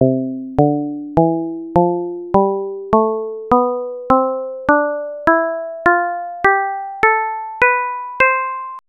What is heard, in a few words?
pizzicato